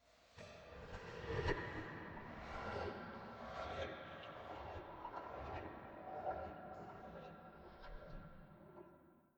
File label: drowning calls, horror